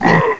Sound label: frog die; frogdieold; Ferazel's Wand Demo